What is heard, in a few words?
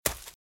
Footsteps Leaves Sprint Left; RadwinIsland